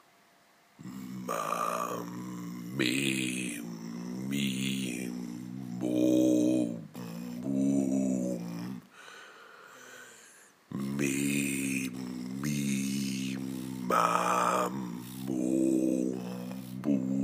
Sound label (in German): Stimmübungen Resonanz